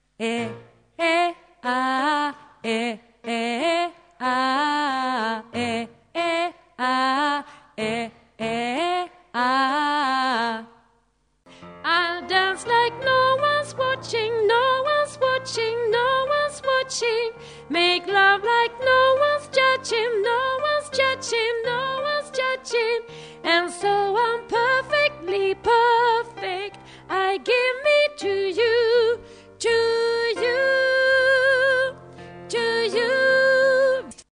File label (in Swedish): bas, stammor